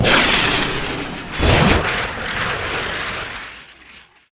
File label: rpg away; weapons; bullets